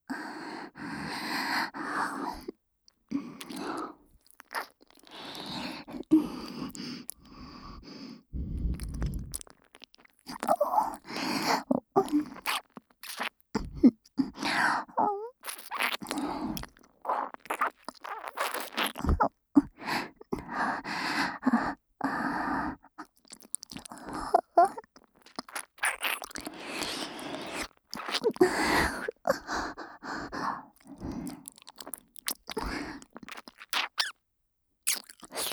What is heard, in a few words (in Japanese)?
耳舐め手コキ＆エロ吐息ループトラック, ASMR